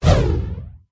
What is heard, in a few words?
cat attack contact bottom